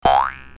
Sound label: Boing